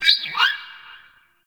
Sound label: Forest Bird Mid; Ambience